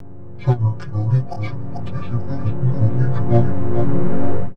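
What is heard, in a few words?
Pad Synth; Equipped Music; Loungin House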